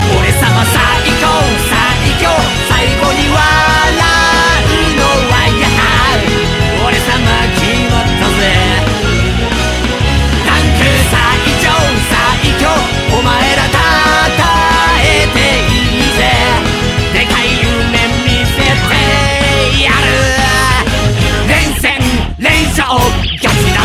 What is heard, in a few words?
Song